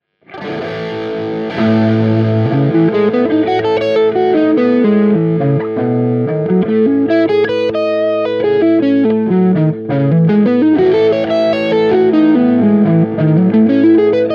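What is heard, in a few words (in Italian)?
Esercizio Arpeggi Modali lidio, chitarra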